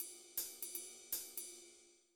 blues; Sounds Terrific II

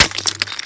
smoke grenade land, weapons foley; Spearhead Demo

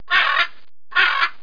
Parrot

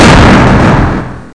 big explosion near; Bolo; BoloSounds

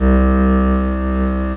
Clarinet C2; Star Trek JR Audio

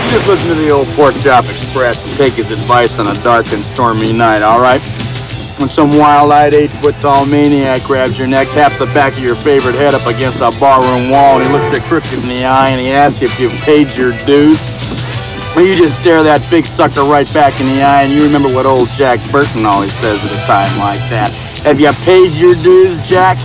windows start

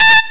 Trumpet; The Epic Collection 3; Epic Marketing; muzak samples

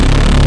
Beefy Slow Gun; A-10 Attack!

